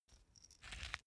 small pepper grinder glass ringing; industrie-wirtschaft; gastronomie

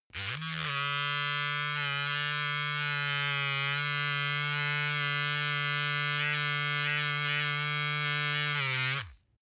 razor clatter on load; maschinen; rasierer

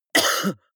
cough male single; koerpergeraeusche

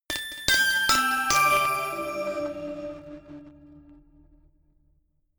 notification glockenspiel crazy sequence descend; instrumente

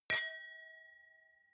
wine glass different clink soft; berufe; gastronomie; soundpool

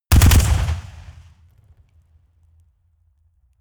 rifle ak-47 exterior fire burst bass

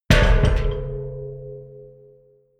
massive metal gas bottle low

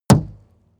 metal impact deep dull

